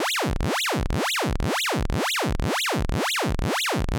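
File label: Alchemy LFO Triangle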